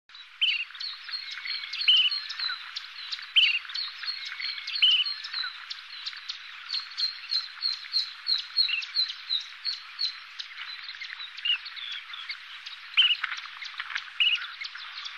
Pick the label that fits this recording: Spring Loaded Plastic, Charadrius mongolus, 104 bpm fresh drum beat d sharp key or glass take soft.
Charadrius mongolus